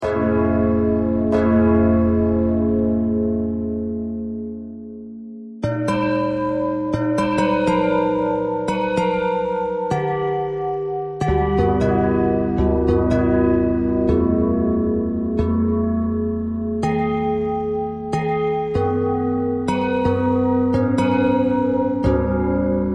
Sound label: bell bell thread noise, bells